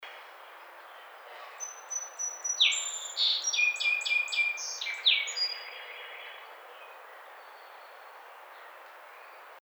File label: Song Sparrow